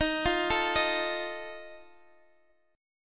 D sharp Suspended 2nd Flat 5th D sharp, Guitar Open D; x,x,13,11,12,13; chordshape